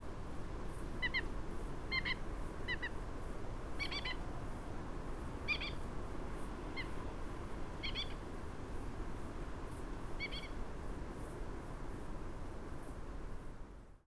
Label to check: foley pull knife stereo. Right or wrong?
Wrong